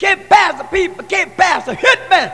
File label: hit